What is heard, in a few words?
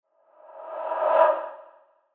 ghost